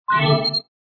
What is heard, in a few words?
success